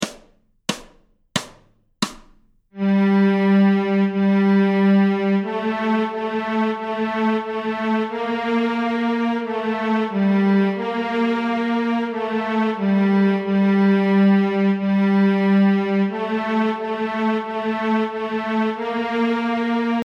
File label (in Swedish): Stråk Gmoll, Fiol; Danmark; Notträning